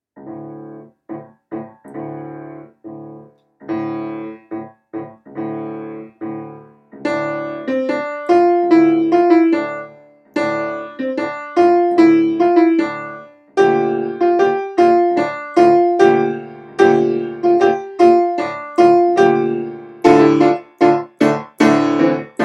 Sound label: improv D min, piano improvs; Noam D. Elkies piano improvisations